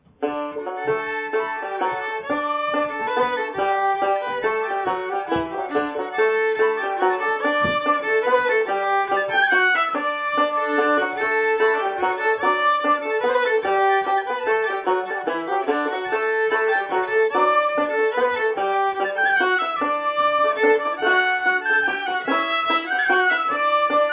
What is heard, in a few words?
Waltz Session